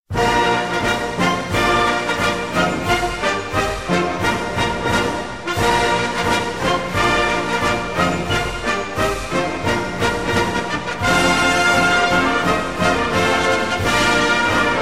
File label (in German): Marsch; Preobrashensker Regiment